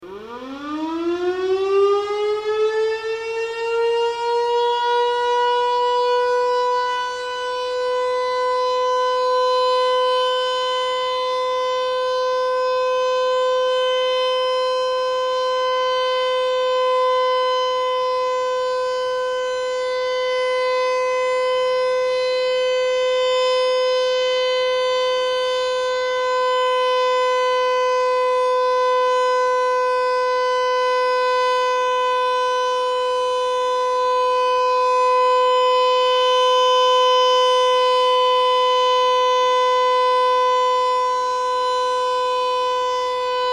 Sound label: warning siren